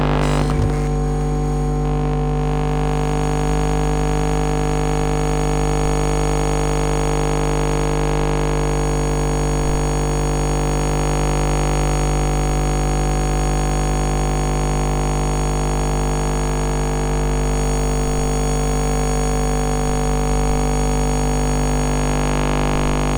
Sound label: chase; slender; fnaf2; animatron2